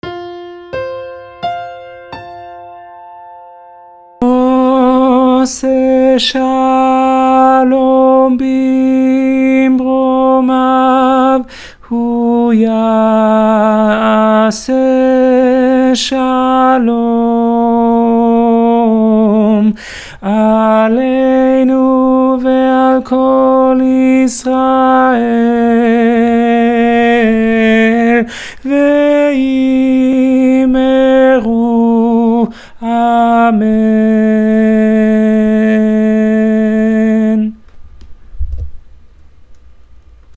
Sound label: spanish tenor